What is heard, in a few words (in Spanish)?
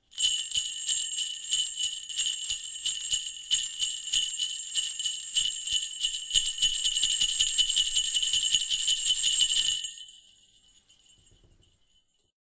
Cascabeles con mango